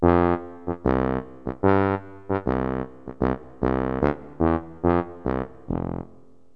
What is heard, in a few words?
tuba; Roland; An Invitation To The Roland World Of Music